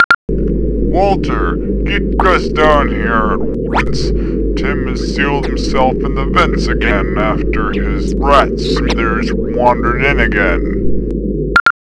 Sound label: datapad; svencoop; nlab